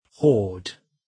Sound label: horde